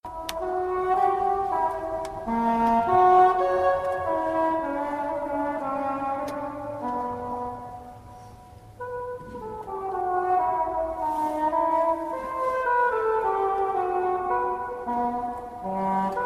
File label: Oboe da Caccia; Partita; Bach; Sarabande